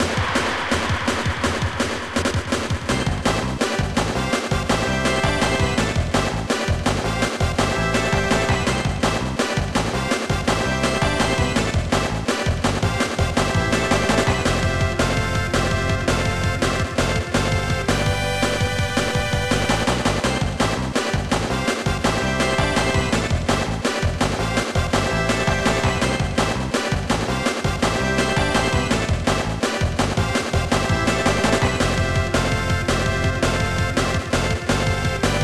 Goal; J League Super Soccer 95; SNES

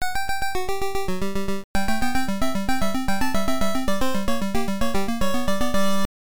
Game Start Music; Dig Dug; NES

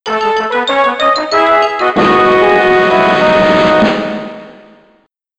Completion Jingle; Gex 3: Deep Cover Gecko; PlayStation

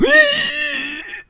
Pig Freeze; Angry Birds Space

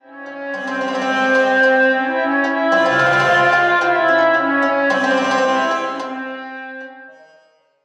Egyptian Music Sting

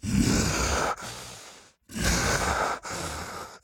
Walk Cycle Burns; The Town

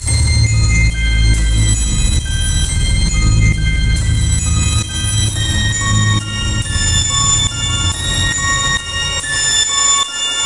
Loop

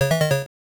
COIN; Gardia; arcade

